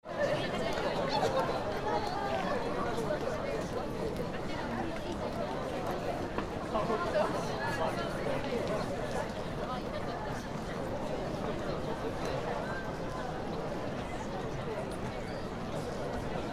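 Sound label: Fukushima University centeral square; The Central Sauare of the Fukushima University; Koji Nagahata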